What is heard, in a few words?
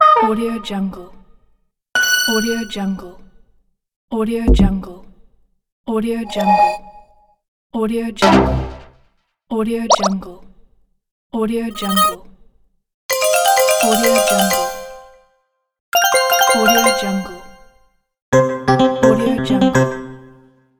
gui phone menu pack